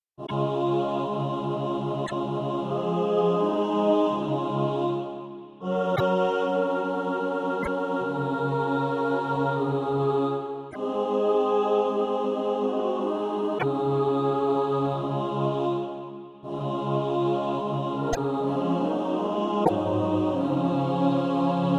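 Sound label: mixed, SATB Practise Music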